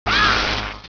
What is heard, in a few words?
Teleport; bart bash